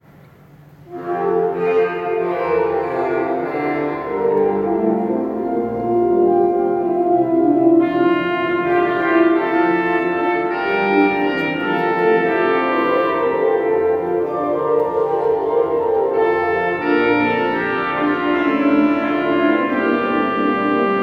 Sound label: Organ Music; europe 2013